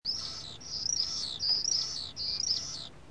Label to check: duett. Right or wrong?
Right